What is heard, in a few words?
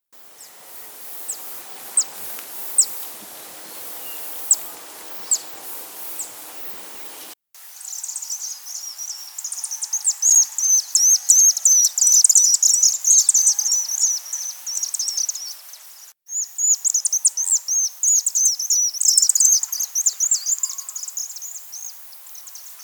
Vauxs Swift